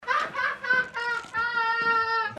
hahahaha; joker

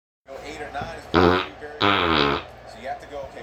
fart

